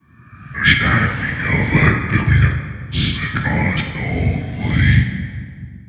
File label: nightmare idle